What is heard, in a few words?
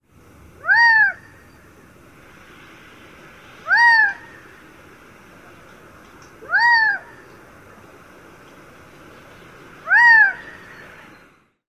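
calls, Gambel's Quail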